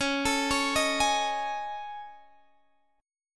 A Major Sharp 11th C sharp

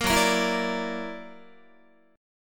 F sharp Augmented 7th, down, Guitar; F#+7 strummed; 13,14,13,14,14,13

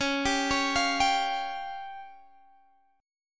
Db Major Flat 5th, Guitar Open G

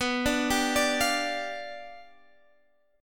G 7th B

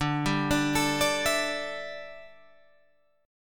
D Suspended 2nd Suspended 4th, Guitar, Open G

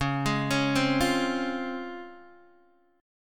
C sharp Major 7th C sharp Guitar DADGAD

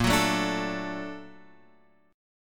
A sharp Augmented Major 7th

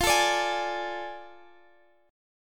A Major 7th Suspended 4th Sharp 5th, chord

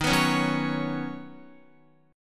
Eb Minor 7th Sharp 5th Eb down Guitar; strummed

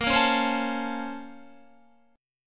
A sharp Minor Major 9th, down, Guitar; A#mM9 strummed

